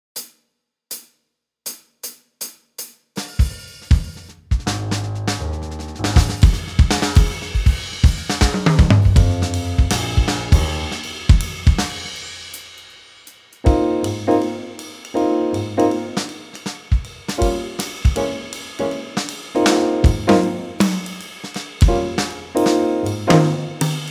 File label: bass bright swing 160; Etude Backing Track